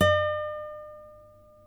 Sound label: NYL NV E, nylon no vib guitars; Spectrasonics; Hans Zimmer Guitars VOL-1